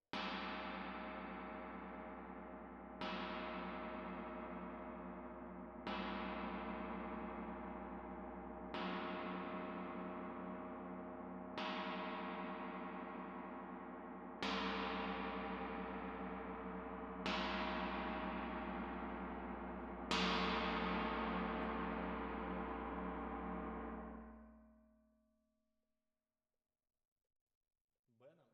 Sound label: Tam-Tam Close